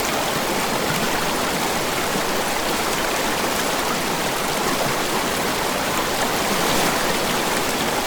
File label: waterfall